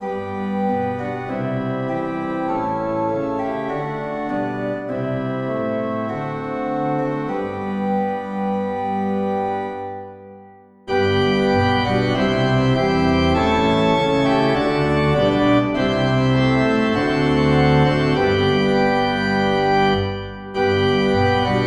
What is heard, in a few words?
Organ